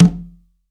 CONGA L; STEREO CONGA; Cuba

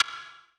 Sidestick W Verb; Sonic Foundry (Sony Creative Software); Knutrix Drum & Bass

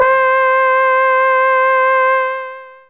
Brass1 C4, Fairlight CMI IIe

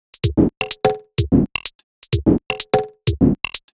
127bpm, House Drumloops

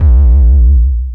Bass Lick, Drum & Bass; Fluid Dynamics

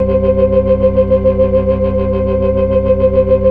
FAST VIB, ORGAN, TREMOLO VIB

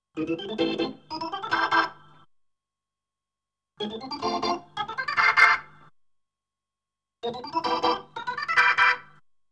B3 Organ; Masterbits CLIMAX 8

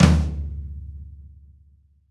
Rock Flam Tom, Roland BK-5

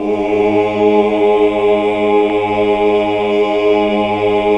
OOH, Hallelujah

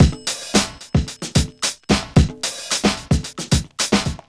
POWER 111, Funky Elements loops; Zero-G

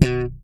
SLP POP B, PEDULLA BASS; Masterbits CLIMAX 7 - Bass

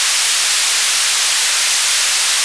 HPF NOISE; Zero-G Ambient 1

